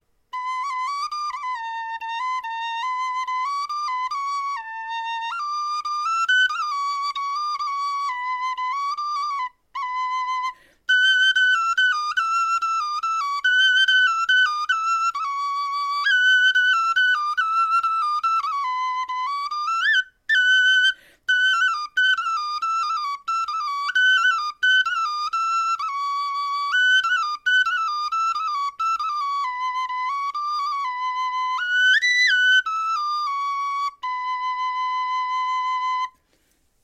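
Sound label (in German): melodie, Knochenflöten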